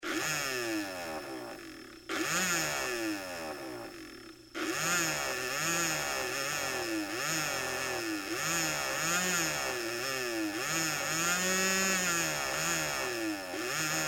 Toy Bubble Machine Revs Many; Miscellaneous Toy Sound Effects; Big Room Sound

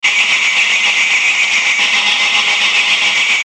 Chopper Vibrato Static Filter; Blastwave; Choppers

